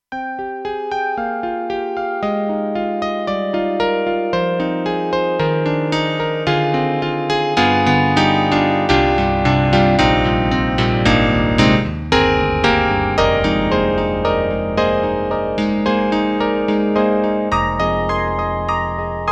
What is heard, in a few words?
Roland SC-8850 Piano 2